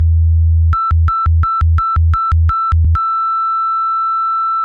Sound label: techno